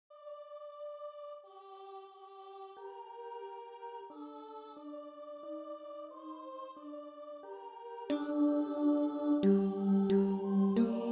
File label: Tenor; Pater Peccavi